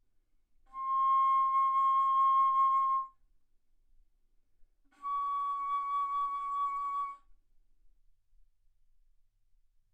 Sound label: Bass Flute C6 Db6